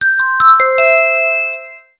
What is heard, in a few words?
School Class Change